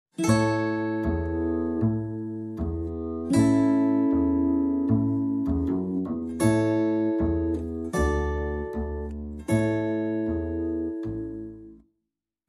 Guitar and Bass Only

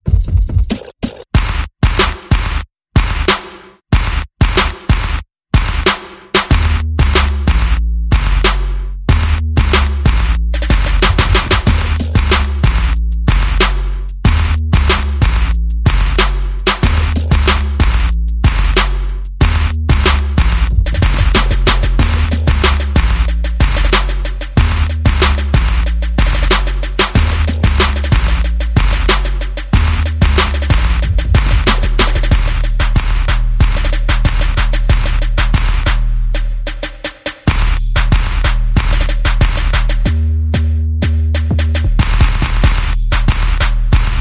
Bass and Perc

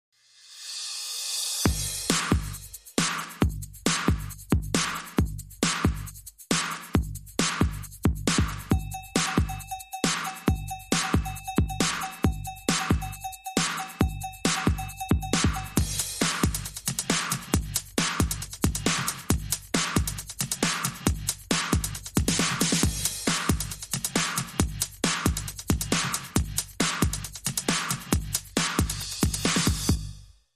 Bonus Beats

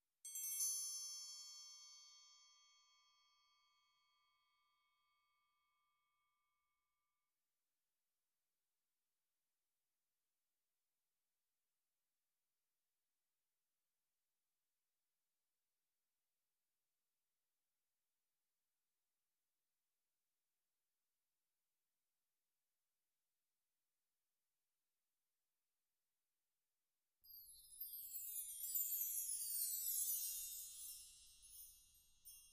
Rhythm Only

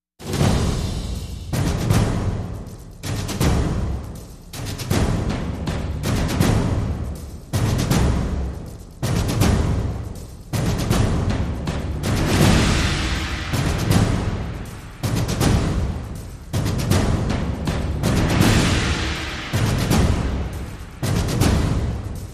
Percussion Only

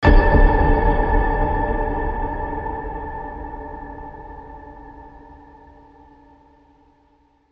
Bell Ping

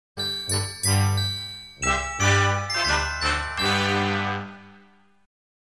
Deck The Halls, Brass Band and Small Group

